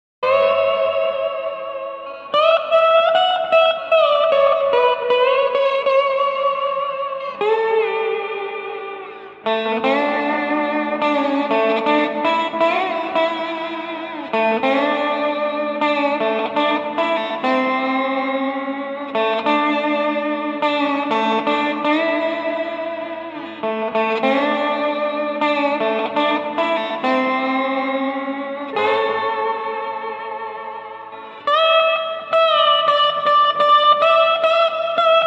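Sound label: Street Slide Guitar Melancholy, 100 bpm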